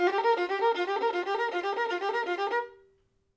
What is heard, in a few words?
violin